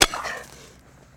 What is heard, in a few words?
digital signal